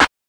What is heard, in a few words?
Clap, Drum One Shots; Cymatics; Eternity Sample Pack